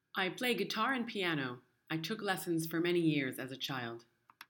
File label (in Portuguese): tocar guitar